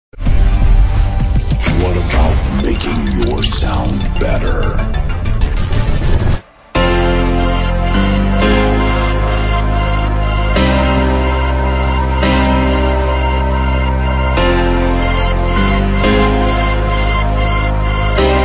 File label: Future House; W. A. Production; Future House Toolkit Sample Pack